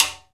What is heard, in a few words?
piala, timbales; E-MU Producer Series Vol. 2 – More Studio Essentials; Composer; Timbales Xswitch